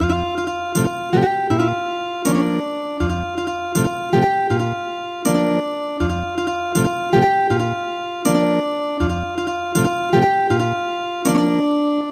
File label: Ethnic Guitar; traditional; Ljulja ljulja ljuska